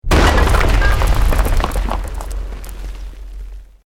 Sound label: EXPLOSION MEDIUM STONE ROCK; Created explosion effects, house, building or vehicle effects; Bombs